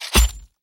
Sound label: Knife Impact; Prison Architect